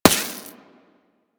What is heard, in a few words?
Laser Impact Generic; Surface